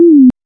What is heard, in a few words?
jump